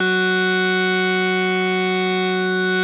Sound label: basses; Wizoo - Powered Wave